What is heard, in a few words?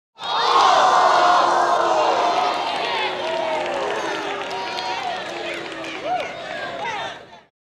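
large crowd disappointed; Asian Show Sound Effects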